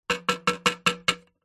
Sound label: household wooden spoon tap on metal pan soup; zapsplat